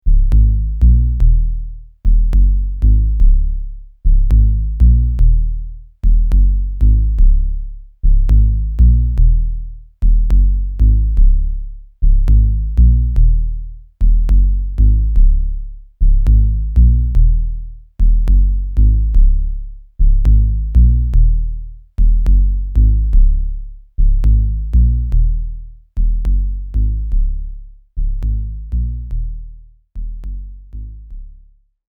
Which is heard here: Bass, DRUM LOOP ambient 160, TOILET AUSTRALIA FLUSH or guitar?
Bass